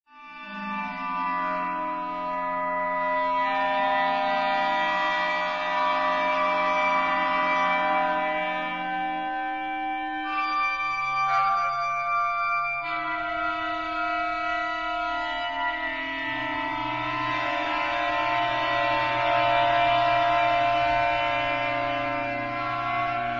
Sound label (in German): klarinet; für Klarinettenquartett